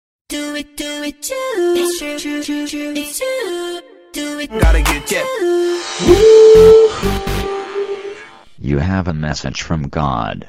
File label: Woohoo Msg